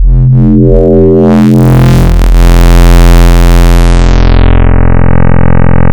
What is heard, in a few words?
wavetables digital; basique